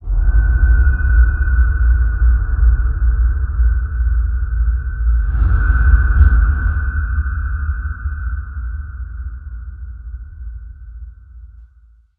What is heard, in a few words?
Ambient; Barotrauma; Room FX